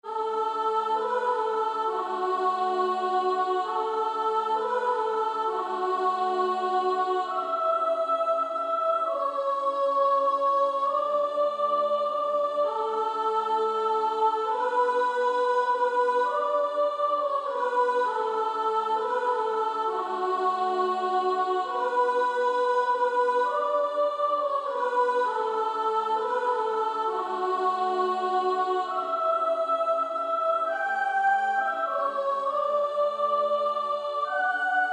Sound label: Female Choir; 1st Soprano; SSAA